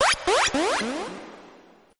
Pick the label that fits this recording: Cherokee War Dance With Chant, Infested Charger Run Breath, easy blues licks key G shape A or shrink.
shrink